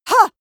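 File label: female combat attack short, screams; schreie; menschen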